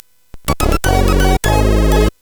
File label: Game Music, CSG8580R5; sid